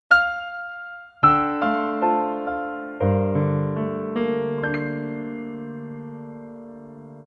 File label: text tone